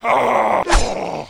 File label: Attack